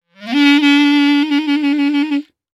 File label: Clarinet